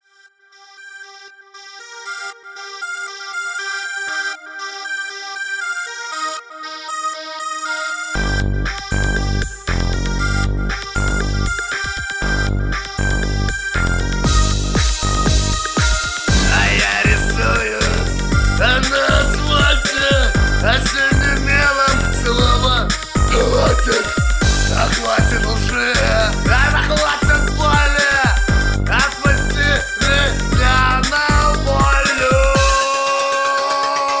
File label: hip-hop